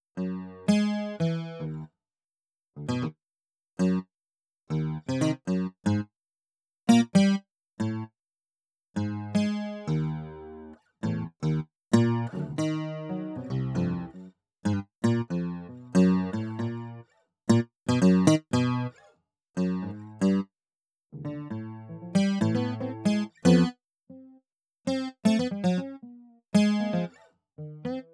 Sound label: guitar